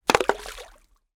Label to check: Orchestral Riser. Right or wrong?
Wrong